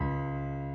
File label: key; keySounds